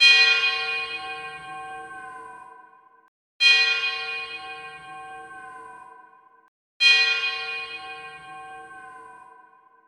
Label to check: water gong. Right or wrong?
Right